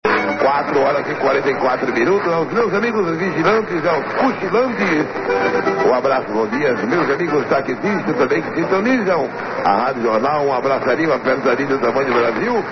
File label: am alagoas 1200 kHz; r jornal